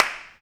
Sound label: Snares, Clap n Snap